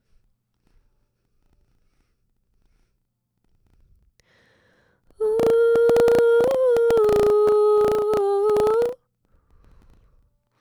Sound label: vox harmony